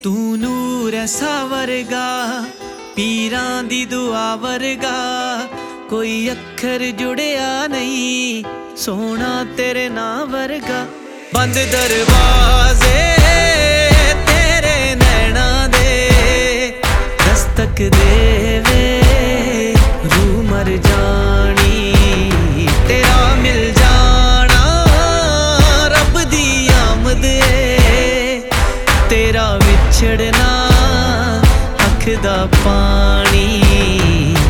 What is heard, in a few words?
band darvaze ringtone